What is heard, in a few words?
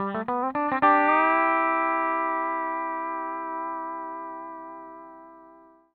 105 L, PD LOOPS